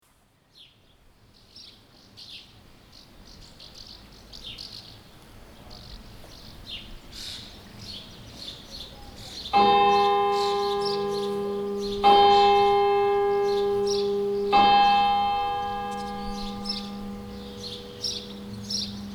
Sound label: Birds and Bells at Santo Domingo de Silos